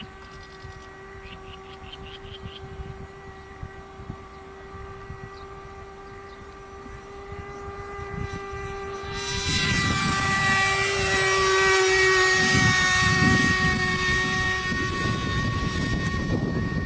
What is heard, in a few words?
f16 takeoff